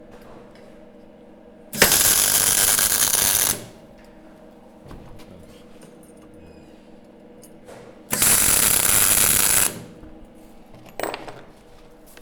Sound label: Welding Metal